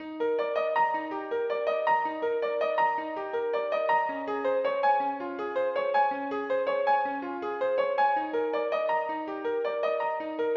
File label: stream improv